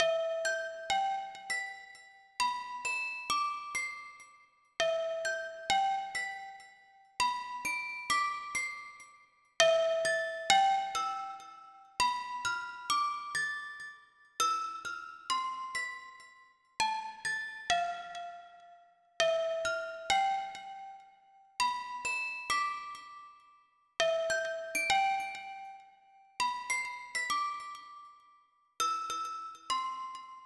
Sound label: music box 100bpm C